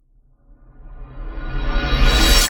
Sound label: cinematic reverse